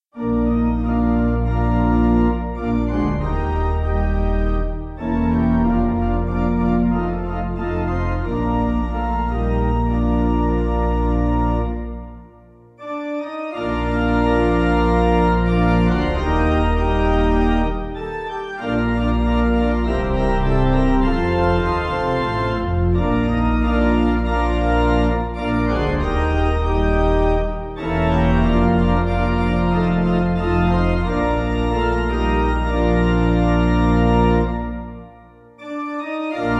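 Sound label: pipe organ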